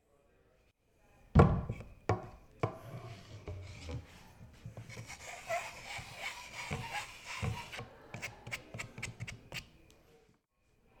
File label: Pool Cue And Chalk